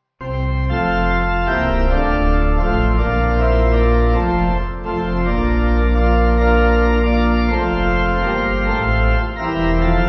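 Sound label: Pipe, Pipe Organ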